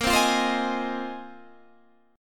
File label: Bb 7th Suspended 2nd Suspended 4th Bb